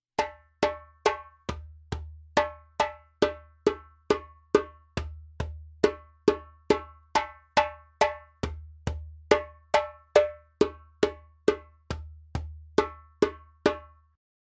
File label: djembe; djembebog oevelse